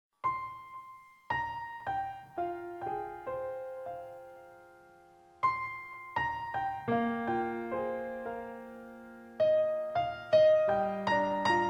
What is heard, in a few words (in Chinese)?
(Strings Version 旋乐版)